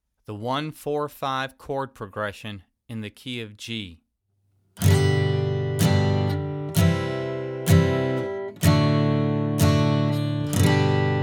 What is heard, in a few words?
1 6 2 5 Chord Progression